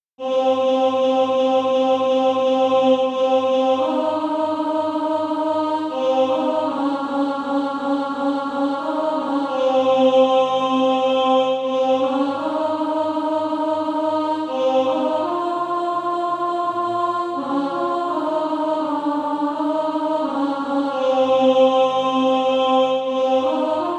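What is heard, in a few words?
alto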